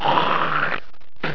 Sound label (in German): SPUCKEN